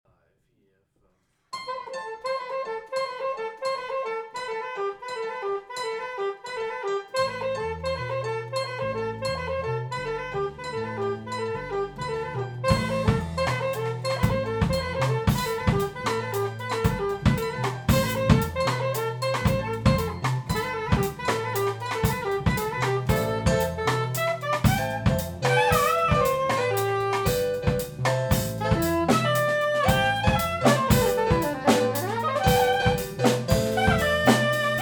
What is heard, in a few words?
Solo; Probe; Zwei Kämpfer (synchron, souverän, aggressiv)